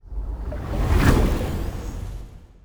construction ship move; aquatics

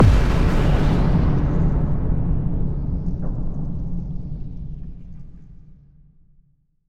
ship explosion; Stellaris

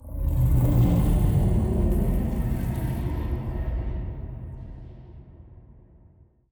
mutated void worms muted; Stellaris; grand archive

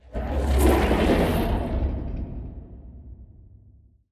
void worms troika attack; Stellaris; grand archive; ships

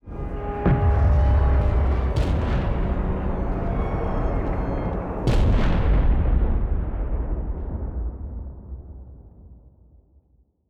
grand archive destruction; Stellaris

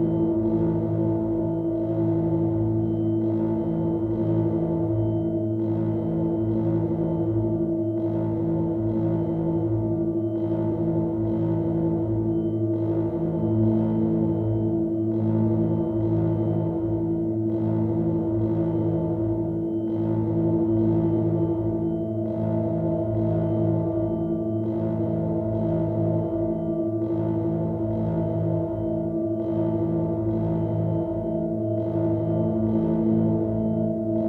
ship juggernaut idle; Stellaris; machineage; ships

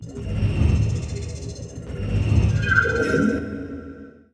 scanner; Stellaris

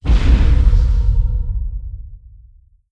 distance stellarite attack plasma; Stellaris; guardians

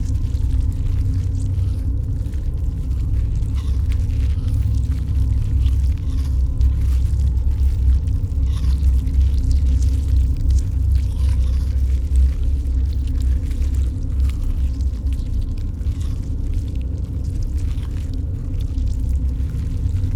ant ship mining station idle; biogenesis

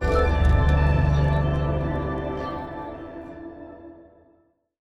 theme treasure muted; Stellaris; grand archive

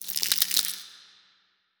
small leg in out; biogenesis

